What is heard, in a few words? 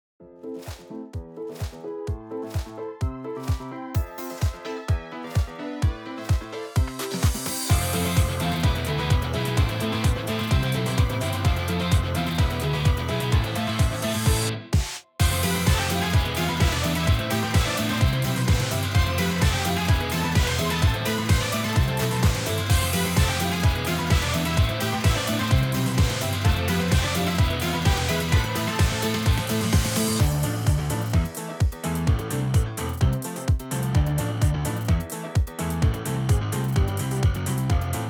off vocal